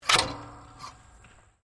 Clay Pidgeon Launcher Manual Firing, Gun Club sounds; Miscellaneous Sports